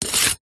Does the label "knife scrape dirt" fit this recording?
Yes